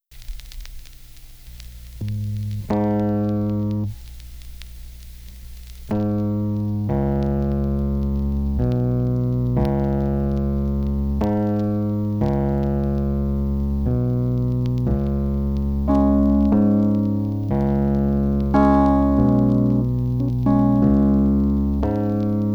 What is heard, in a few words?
Dawn (Instrumental Version)